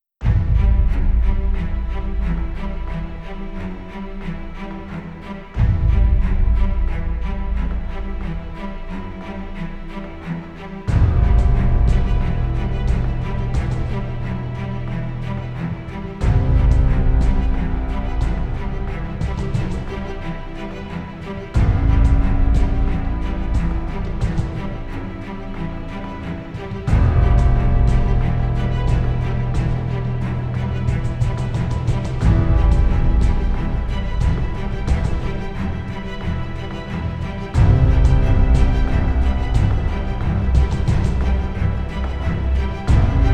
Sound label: Worlds Enemy, Drama; Narrative Mind